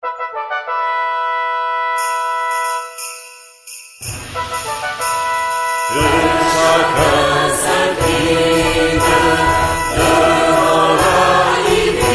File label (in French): Fanfare; Fanfare de la Reine; fontaine